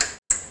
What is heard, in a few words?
switch strength stove